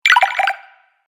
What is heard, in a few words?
Fantasy Game Collect Sound, video game